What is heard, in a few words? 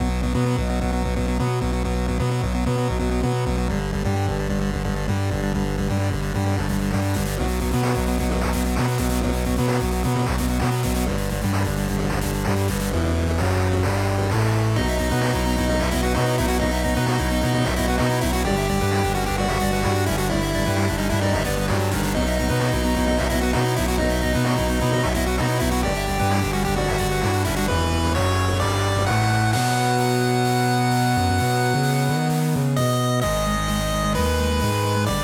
bonus bgm; defenders of ekron